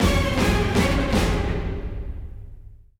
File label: Story Beats Urgent Intro